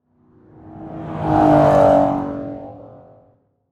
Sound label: Transportation Car Race Car Drive By From Cement Block